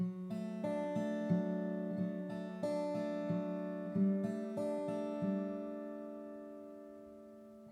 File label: Gtr Ac